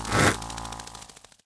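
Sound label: scarab green damage, monster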